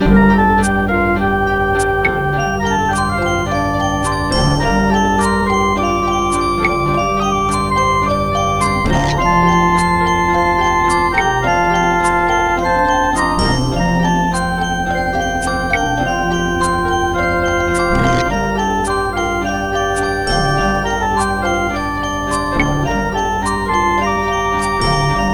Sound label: music; Camas; greyarms